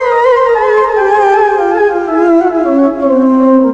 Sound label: SYNTH, ARABIC